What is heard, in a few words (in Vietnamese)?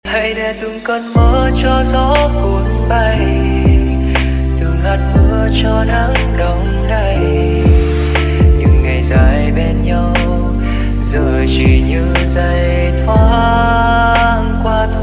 Nhac Chuong Lofi